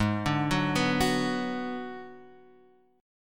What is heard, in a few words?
Ab Minor 7th Flat 5th, Guitar, Open F; arpeggiated; JGuitar